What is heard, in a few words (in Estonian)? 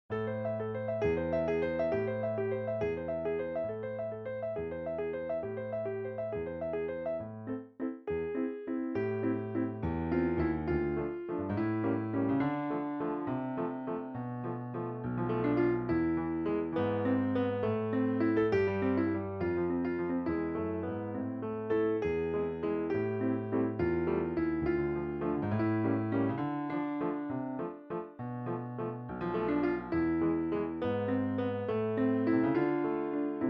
prantsuse valss; standardtantsud